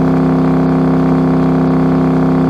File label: Engine Muscle Rpm High; kart racing; Boom Karts; Android